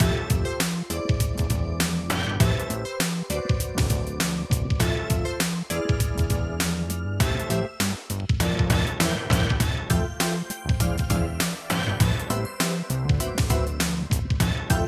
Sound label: New Jack, Retro